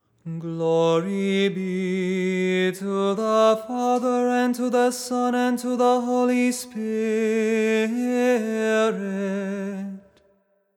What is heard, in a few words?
Tone 8 glory be